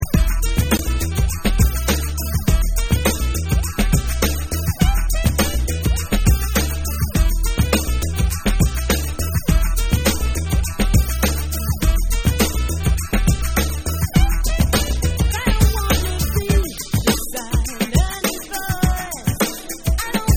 Sax

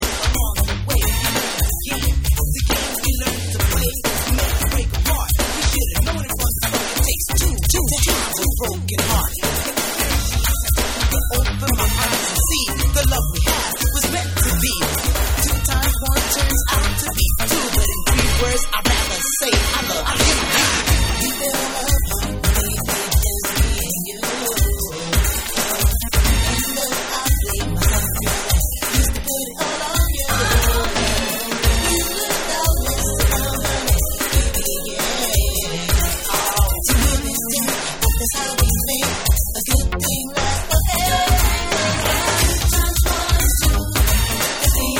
Vocal Rappin Remix

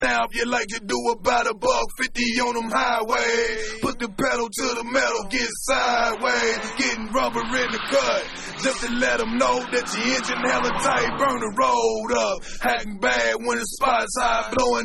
Acapella

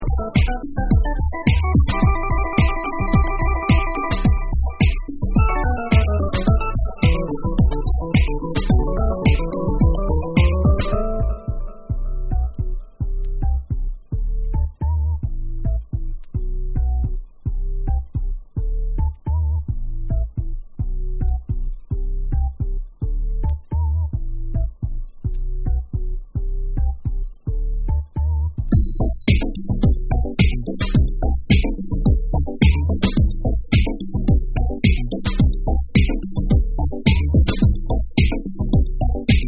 Instrumental Dub

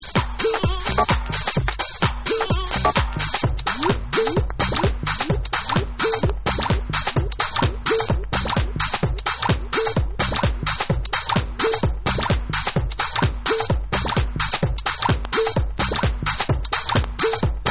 STREET CORNER BEATS

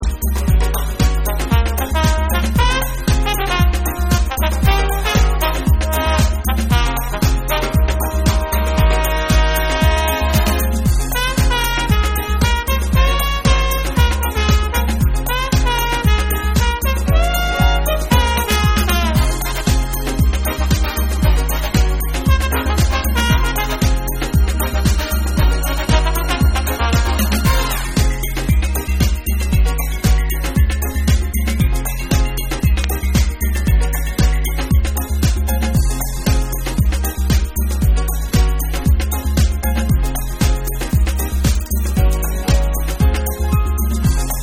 Symphonic Jazz Mix

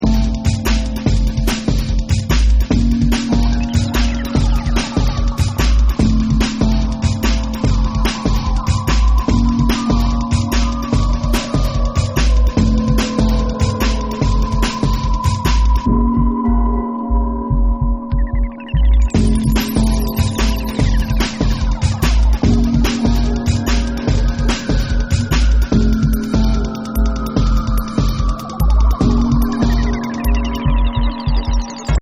Instrumental

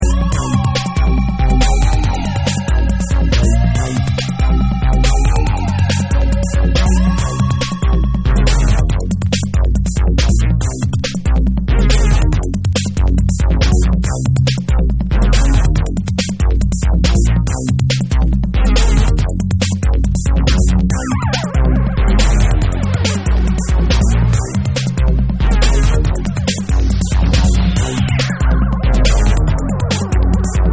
ELECTRO

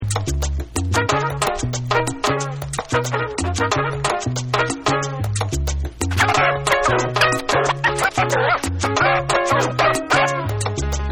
SALSA SCRATCH

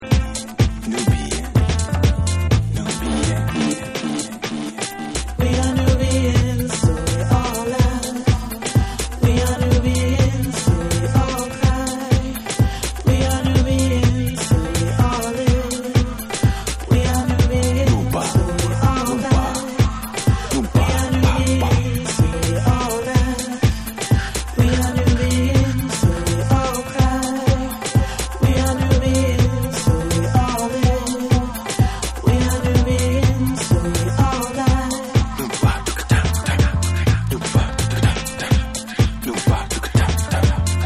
Dub

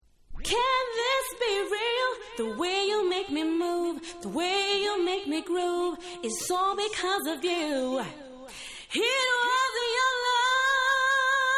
Acappella

